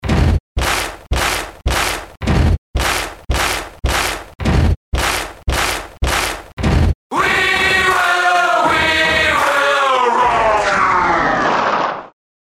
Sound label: rock click, Logic Pro Klick